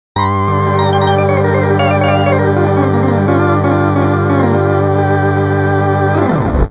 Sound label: Rock Organ